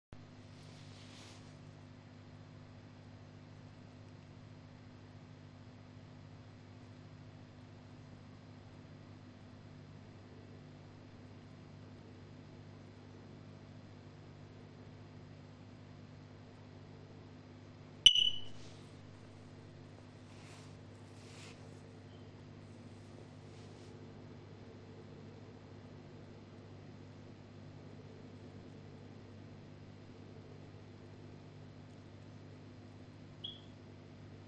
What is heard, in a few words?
Low battery, rauchmelder